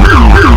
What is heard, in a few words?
yelp, rumbler; sgmcars; vehicles